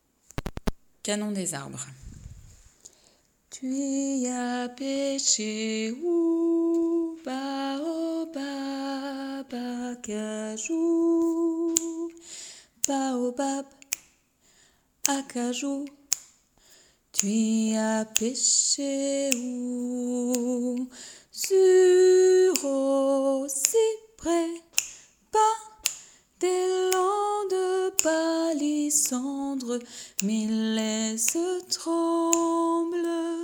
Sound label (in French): Canon des arbres